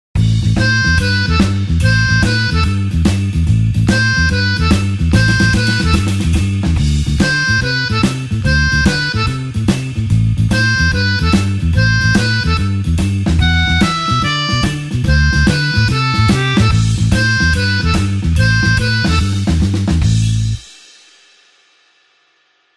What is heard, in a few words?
blues harp shuffle A